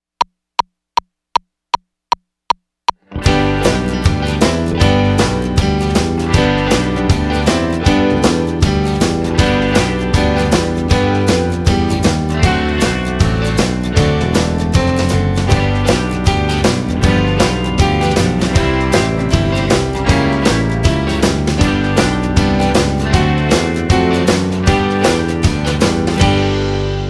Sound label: W/O Guitar